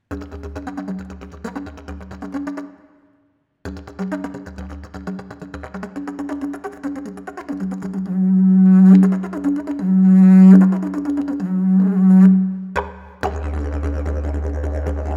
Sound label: didge